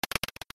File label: dice thrown; ff3us